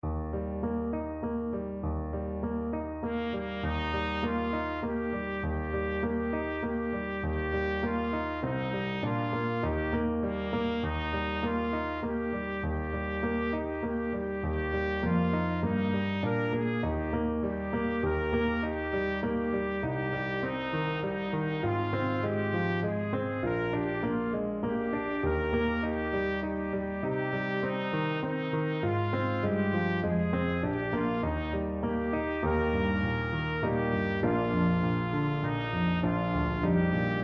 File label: shabat hamalka trumpet